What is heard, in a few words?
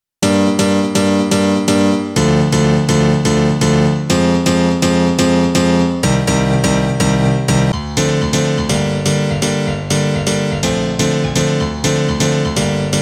piano house essentials